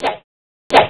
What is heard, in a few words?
slash, katana; weapons